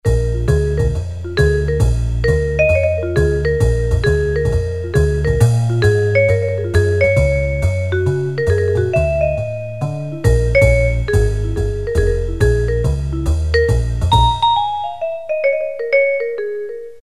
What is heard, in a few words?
Vibes